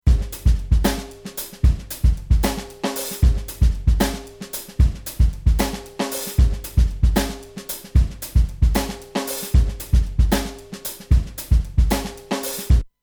Rupert Neve Designs 5034 Newton Channel Drum MIC PRE